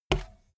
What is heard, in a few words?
bmx bike tire kick